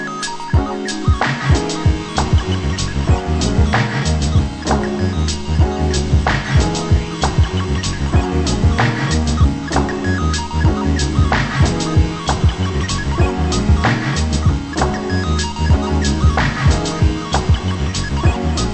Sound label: beat